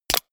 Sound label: medieval click light positive